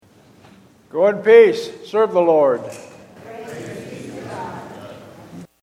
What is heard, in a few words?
Closing Response